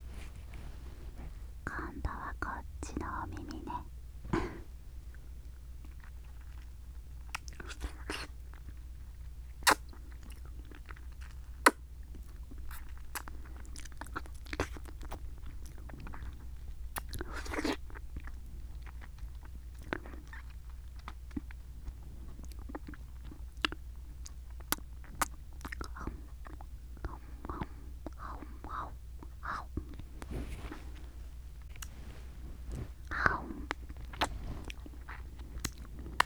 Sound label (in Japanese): 左耳ぺろぺろ, ASMR